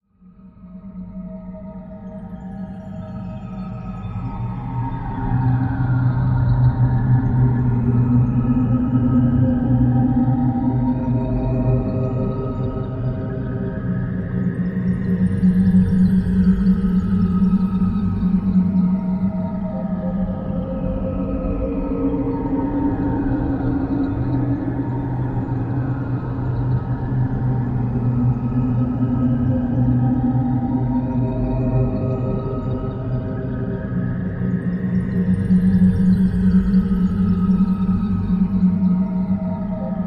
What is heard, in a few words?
Eerie Drone Sweeping